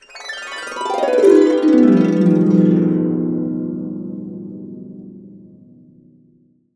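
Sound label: B CASCADE DOWN, Harps; USB Soundscan vol.62; AKAI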